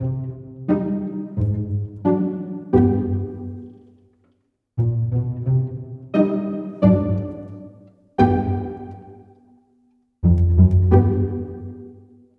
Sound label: Pizz; Pizz V4; Comic 드라마